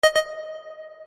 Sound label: click